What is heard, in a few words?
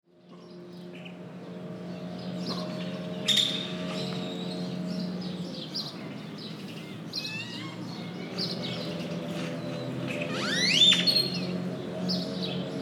Birds Mexico